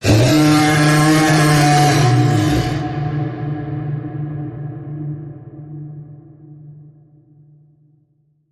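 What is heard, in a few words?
creature groaning beast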